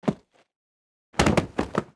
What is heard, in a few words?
Die; Seer; GamigoNA